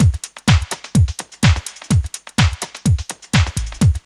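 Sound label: drum loop; X-Static Goldmine 3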